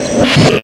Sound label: BACKWEIRD, FX; AMG - Vince Clarke Lucky Bastard